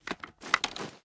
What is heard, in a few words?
reload empty start, weapons; xmag; gmod; viper